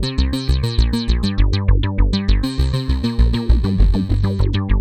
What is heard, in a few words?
FASTFOOT 199, MIXED HOOKS; Masterbits - Tekkno Trax